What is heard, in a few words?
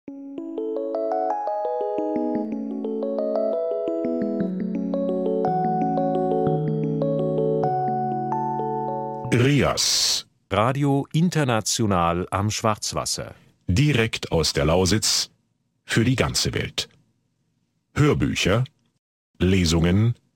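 jingle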